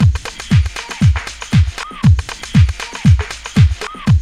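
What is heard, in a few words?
drum loop; X-Static Goldmine 3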